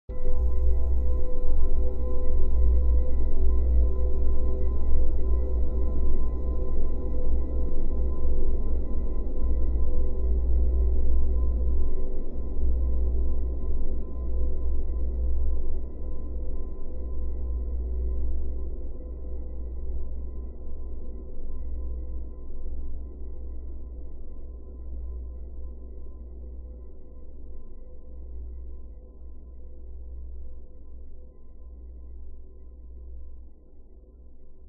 hit soft dark eerie sinister bell like chime long reverb low pitched